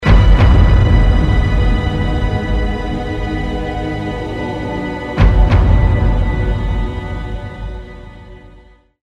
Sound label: mp spawn russia, music; iw4x; iw3